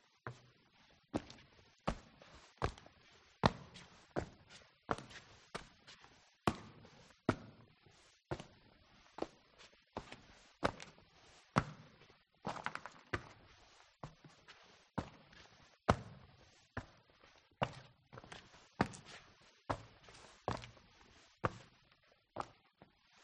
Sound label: foley footsteps rock surface cave int multiple versions natural reverb